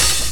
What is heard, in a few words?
HIHAT HIP; Best Service Dance Mega Drums